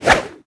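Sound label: Attack One Sword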